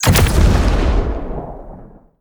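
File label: aoe fire explosion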